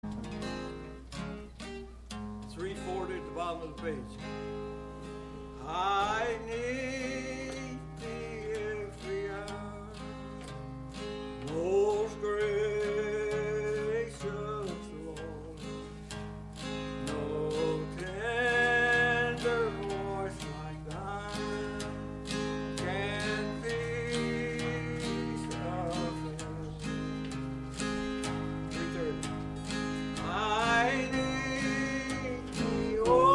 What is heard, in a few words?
Choir; I Need Thee